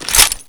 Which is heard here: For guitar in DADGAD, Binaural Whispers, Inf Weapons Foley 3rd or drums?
Inf Weapons Foley 3rd